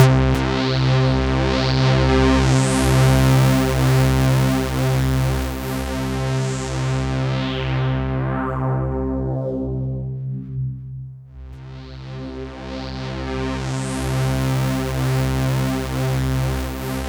C2, SynthLine; 90 s Sample CDs; NiceBeats; SynthLine VOL-1

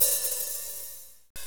HAT OH, Jazz; Northstar - Drumscapes Roland

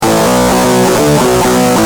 Guitar, Industrial

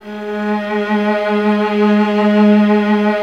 String Ensembles, Violas

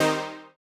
Housey Stab D#3; KG MegaSaur

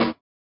SWUSH; Spectrasonics; Metamorphosis

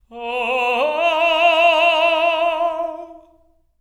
LYRICAL; Spectrasonics; Symphony of Voices

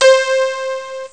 metall chip; Amiga Soundtracker Sample Packs

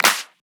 Claps 140; Vengeance - Essential Clubsounds Vol.5

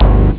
bass guitar; Amiga Soundtracker Sample Packs

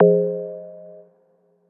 stab faux F, Noise; stabs

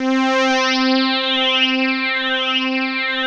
RESOSYNT; East West - Global Sounds; SYNTHEX

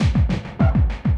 loop, 200 BPM; Drum & Bass; Ueberschall; Drum & Bass Resonance

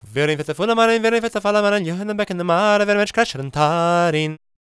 GAELIC, World Voices; ILIO; Vocal Planet

